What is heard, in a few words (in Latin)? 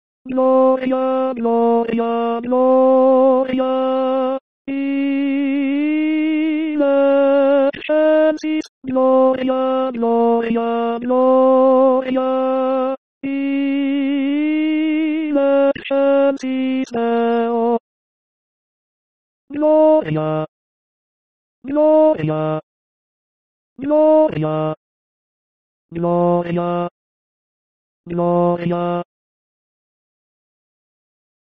Gloria Missa pro pace TENORS